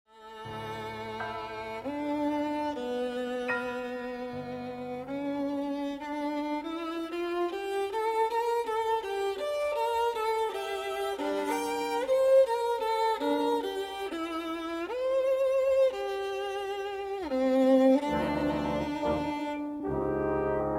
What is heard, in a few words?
Concerto for Violin